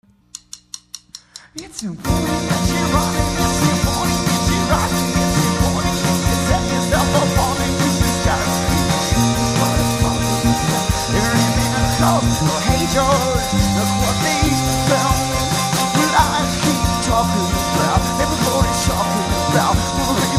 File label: oscillator's hum